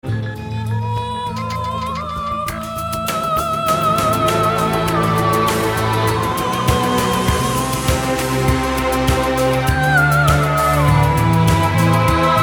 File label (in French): chant lyrique; peugeot